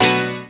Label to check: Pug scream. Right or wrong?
Wrong